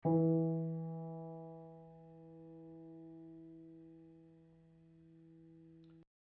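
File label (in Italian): Mi2 orig, martello originale Pleyel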